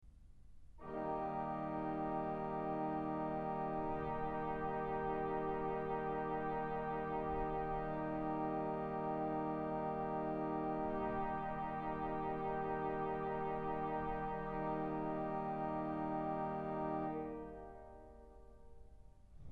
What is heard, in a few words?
Viola da Gamba